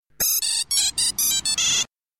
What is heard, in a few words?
electronic